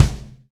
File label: V-Pop Kick, kicks; Roland BK-5